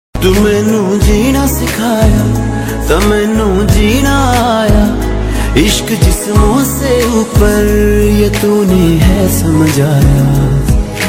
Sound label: punjabi song ringtone